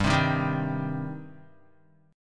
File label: G Suspended 4th Sharp 5th G, Guitar; Gsus4#5; strummed; 2,3,3,0,3,x; 4F,4A,5C,5G,5C,6E